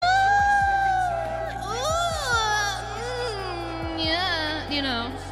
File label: moan